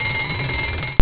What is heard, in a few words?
metal alarm